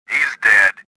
victory; swamp

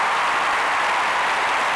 CROWD LOOP

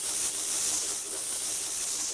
loose snow; Quantum Redshift

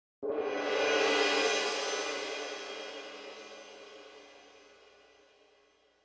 cymbal Swell

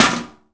steam HIT; The Elder Scrolls III Morrowind GOTY; XBOX

